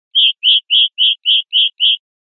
rep golpes

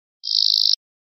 chorros cortos amillerados, verderon

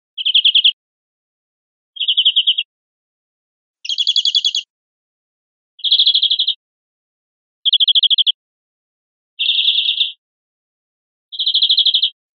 chorros cortos golpeados, verderon

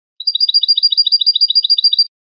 cascabel

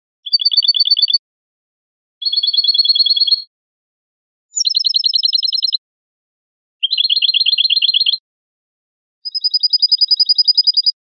rep cascabel

